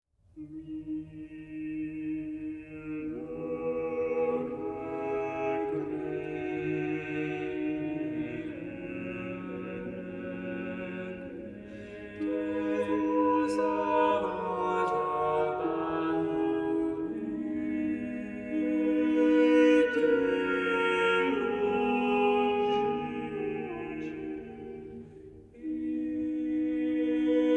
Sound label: voices; josquin; mille regretz